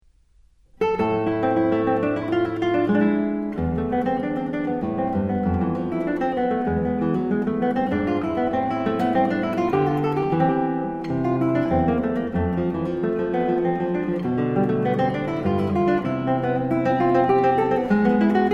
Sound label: courante bwv 1008